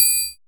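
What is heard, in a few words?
TEK triangle open, Drums, HIP-HOP; Drums In Your FACE!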